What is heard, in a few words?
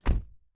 door close; Golden Crayon Sounds